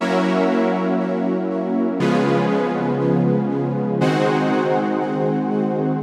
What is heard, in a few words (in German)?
E Dur Nebenakkorde